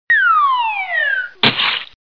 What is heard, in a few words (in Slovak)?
Pad; Revelation Sight and Sound SK